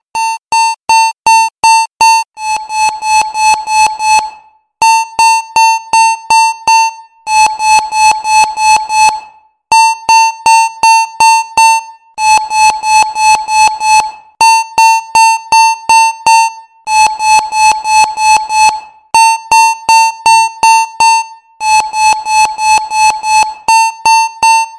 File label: 3d beep; ringtones; sms